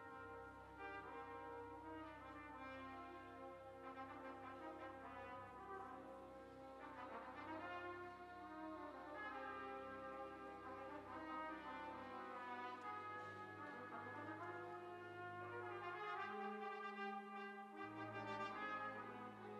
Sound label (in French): Trompettes; Voyage vers l espoir